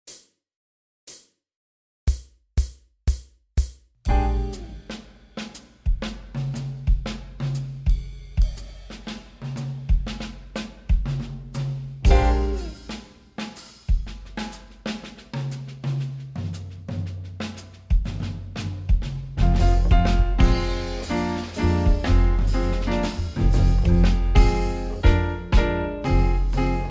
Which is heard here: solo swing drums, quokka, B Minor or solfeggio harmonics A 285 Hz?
solo swing drums